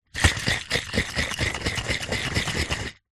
food drink wine red quater bottle shake; zapsplat

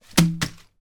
foley paper scrunched up throw hit window

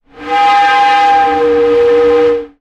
sound design effect air pipe pressure

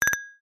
multimedia notification glassy click pop up etc

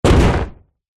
human body slam against surface

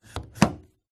vehicles car door locked handle open attempt ext

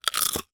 human bite potato chip crisp single

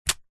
plastic bag water flick onto from hand; foley

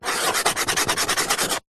chalk stick thick scribble on small chalk board; office

